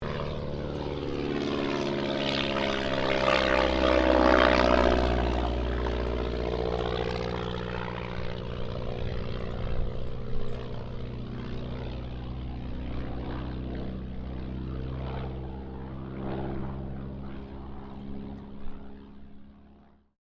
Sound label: AIRCRAFT DH82A DEHAVILAND TIGERMOTH PASS